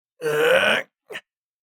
Male vocalization grunt single short

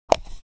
Camera lens cap off

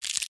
Cockroach being squashed

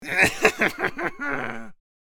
Crazy male psychotic laugh

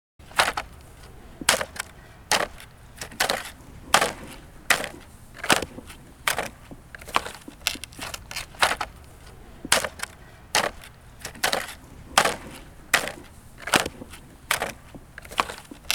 Ski boots walking in the snow footsteps

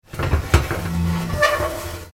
Kitchen oven oven tray slide; cooking

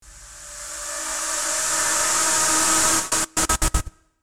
Cinematic whoosh glitch and cut

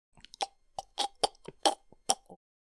Man retching

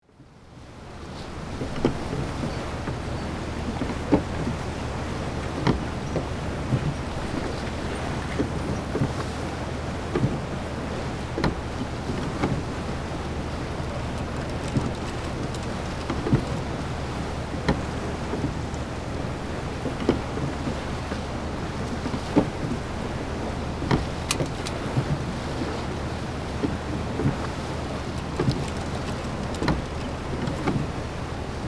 Ship at sea exterior ambience

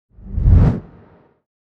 Long whoosh transition

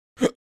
Young male teenager hiccup

